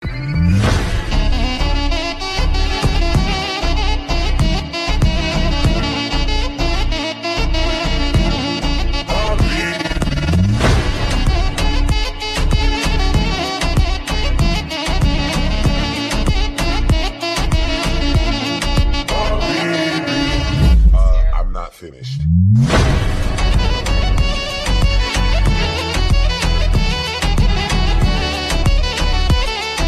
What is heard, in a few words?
Arabic Song